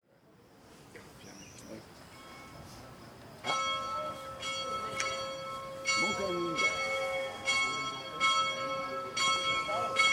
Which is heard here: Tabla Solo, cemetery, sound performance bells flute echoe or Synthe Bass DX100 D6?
sound performance bells flute echoe